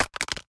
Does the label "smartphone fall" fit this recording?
Yes